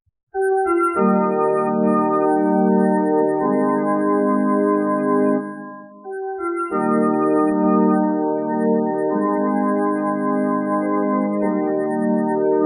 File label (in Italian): solo musica